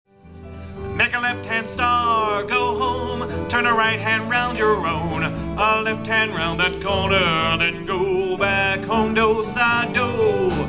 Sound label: lonesome pine tone